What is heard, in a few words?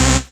Synths Root C